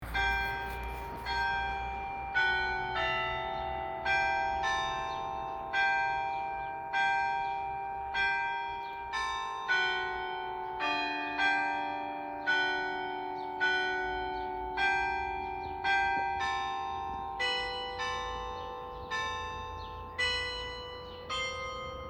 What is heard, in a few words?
Glockenspiel